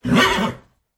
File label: horse; Nick Summer Sports Stars